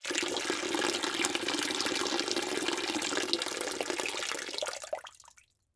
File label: Liquid Water Water Pour Bottle Into Pool